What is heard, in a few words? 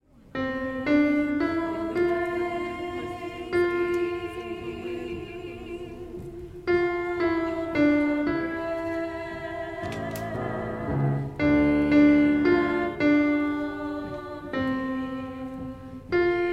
alto; Gather Hymnal